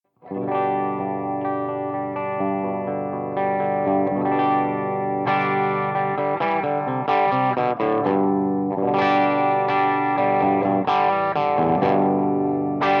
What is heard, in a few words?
G12H Les Paul FX